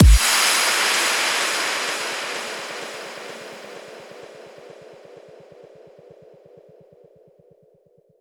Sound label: Impacts